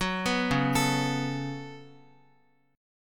Db 7th Suspended 4th Sharp 5th Db down Guitar; Db7sus4#5 arpeggiated; tuning=4E,4A,5D,5G,5C,6F

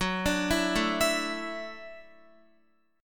Gb Minor 7th Sharp 5th, Guitar; arpeggiated; JGuitar

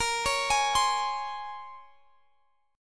Bb Minor 9th Bb

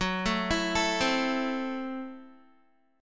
Gb Minor 7th Flat 5th, Guitar; Gbm7b5; arpeggiated; 14,12,14,14,12,x

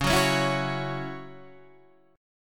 C sharp Minor Double Flat 5th, Guitar DADGAD; 11,9,11,9,9,11

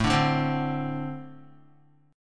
A-Minor Double Flat 5th A Guitar; strummed; JGuitar

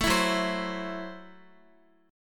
Gb Minor 7th Flat 5th, Guitar; strummed

